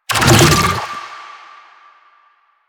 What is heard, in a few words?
Infested Move Start; Warframe